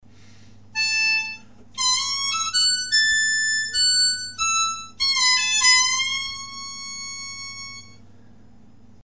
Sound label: solo